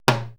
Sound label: drum, 80s Drum Set, DRUMCOMPUTER; Best Service ProSamples vol.55 - Retro Sampler